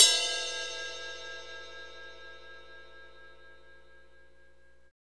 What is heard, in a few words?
cymbal, fast shuffle, Roland; Northstar; Drumscapes Roland; Cymbals